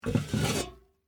Flower Pot Up, Household, Flower, Pot, Pick, Up, From, Wood, Metal, Container, Full